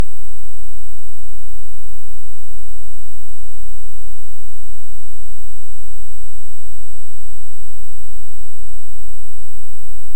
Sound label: Hydrophone; OneOcean